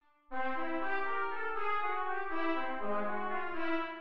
Dominante inv0 en C

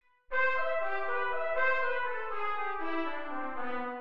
Maj7 inv0 en C

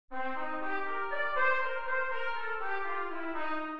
Min7 inv0 en C

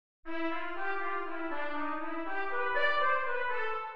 Maj7 en C; Los Guamos

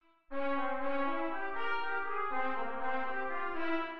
Dominante en C

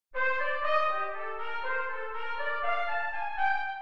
Min7 inv0 en C

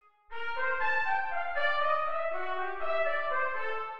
Dominante inv0 en C; Apondera

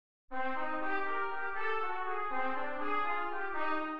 Min7 en C

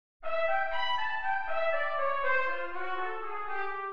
Maj7 inv0 en C

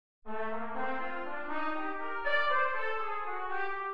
Min7 en Cm

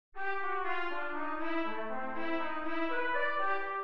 Maj7 inv0 en C; La Majagua

